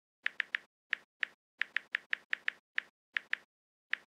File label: typing on phone